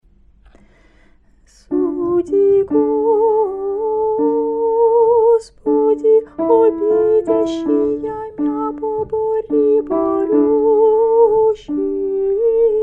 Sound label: great friday evening prokeimenon g sopr f alt